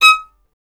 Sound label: STR VLN, Solo Instruments; Miroslav Vitous